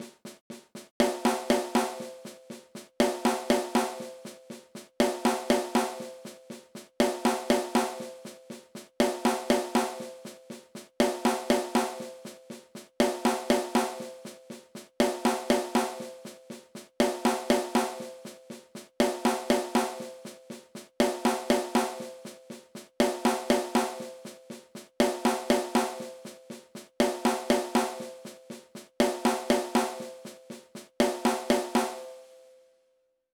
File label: Rim Shots Exercise; learning drums